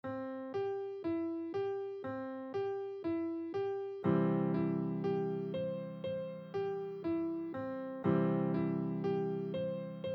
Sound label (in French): Des Gammes et des Arpèges Piano Débutant 60bpm; Les Aristochats